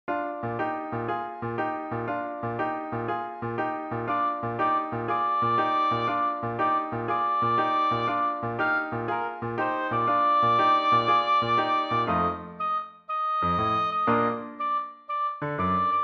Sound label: jingle bells boogie oboe